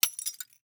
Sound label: glass hit